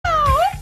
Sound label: aaaauuuu